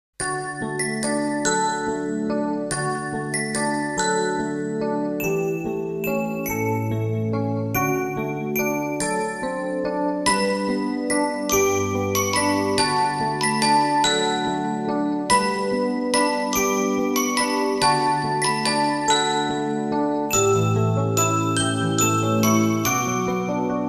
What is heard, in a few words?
Christmas Jingles